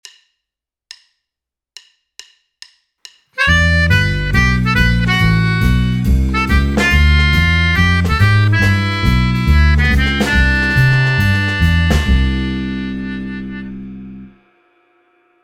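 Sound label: exercise 3 soloist; uprazhnenie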